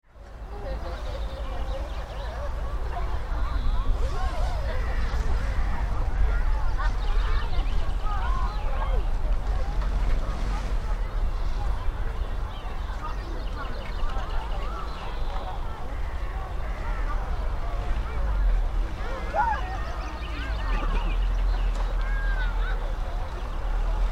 Campsite Atmosphere, Huge concert campsite early morning - snoring; snoring and distant voices - wind - birds etc; Outdoor; Tom Hutchings